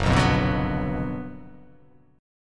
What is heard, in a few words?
F sharp Major 7th Suspended 4th Sharp 5th, F sharp, down